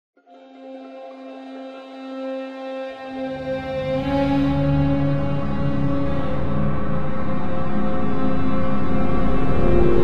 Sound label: Short Music Tension; Solus Project